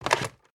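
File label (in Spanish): DESCOLGAR TELEF INHALAMBRICO, pick up cordless telephone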